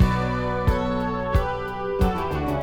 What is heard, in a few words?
keys, Sad